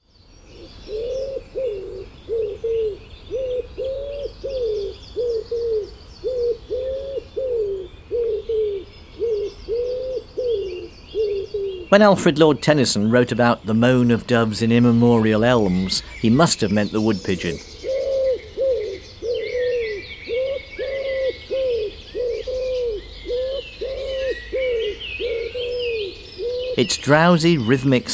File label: Wood Pigeon; Pigeon Ramier; bbc Bird Song Site